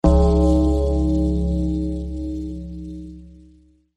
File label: gong; undertaker